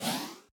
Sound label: speed up